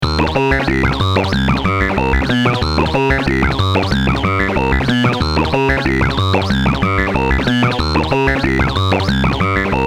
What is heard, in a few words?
vocal; synthmodules